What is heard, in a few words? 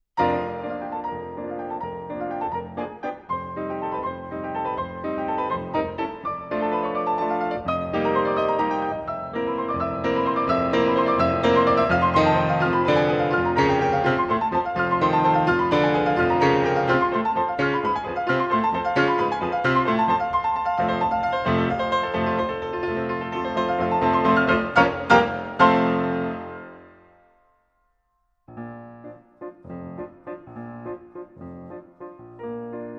Waltz; Sleeping Beauty